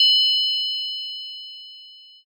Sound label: triangle